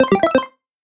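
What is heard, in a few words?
phone notification sounds in 8-bit style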